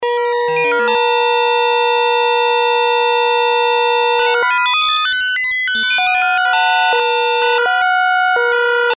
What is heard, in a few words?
yamaha tx81z